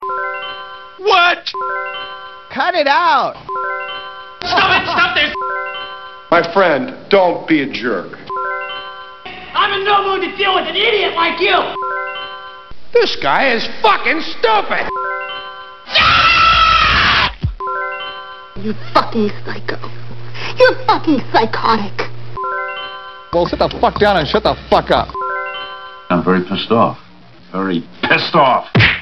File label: Annoying Aol Message